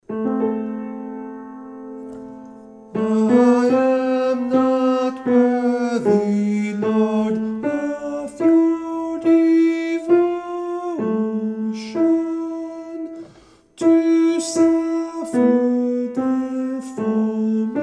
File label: Tenor 1st verse